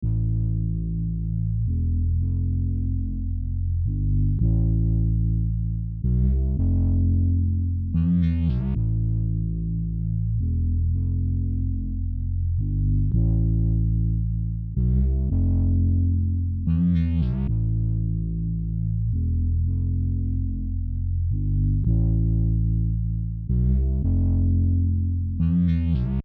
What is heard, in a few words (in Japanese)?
ベース